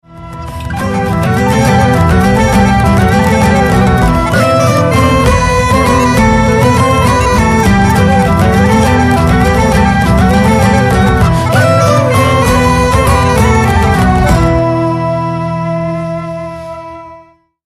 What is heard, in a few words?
flute pipe